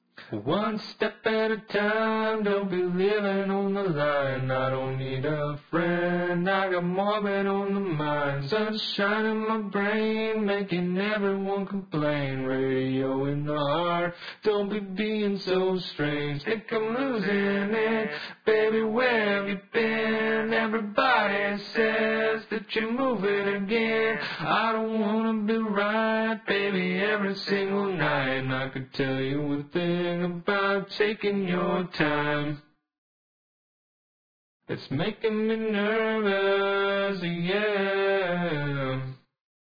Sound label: vocals; Reaper